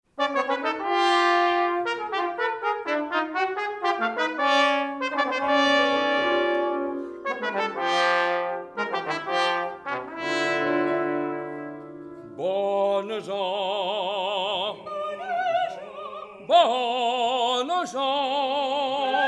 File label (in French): Concert; Le Tonneau de Diogène; Avesne sur Helpes